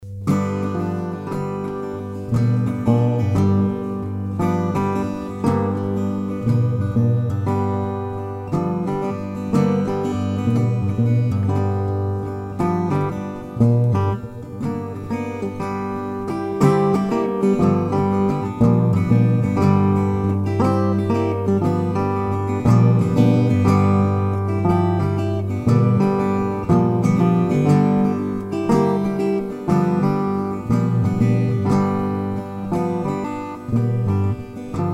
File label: Guitar